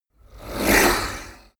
whoosh speaker harsh

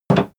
impact dull metal damped; soundpool

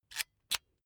electric drill template slide in slow; soundpool

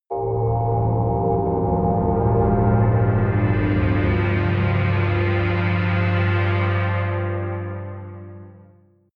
large hollow drone

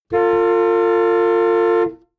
car horn medium interior hummer h3

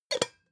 pot lid close; objekte; topf

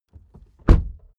suv close door hard

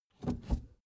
suv pull back gear across soft; verkehr transport; autos

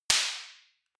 party popper; hits-explosionen

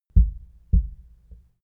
heart beat double slow; comedy-cartoon; koerpergeraeusche

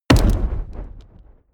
fire impact short; explosionen; comedy-cartoon; soundpool

